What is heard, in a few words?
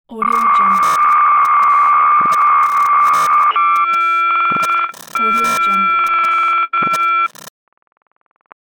switching glitch